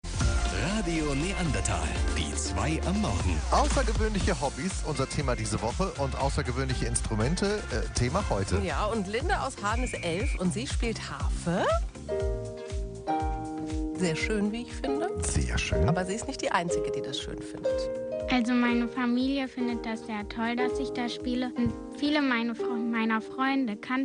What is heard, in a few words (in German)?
harfe; aussergewoehnliche hobbies harfe spielen